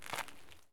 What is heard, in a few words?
walk concrete; Escape from Tarkov